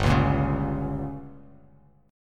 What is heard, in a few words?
G Diminished, Bass Standard; strummed; JGuitar